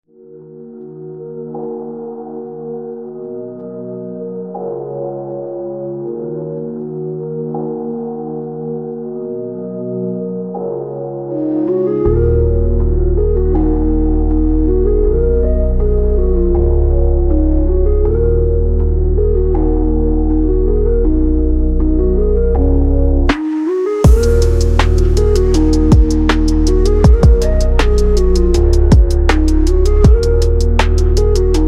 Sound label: Analog Dream Landscape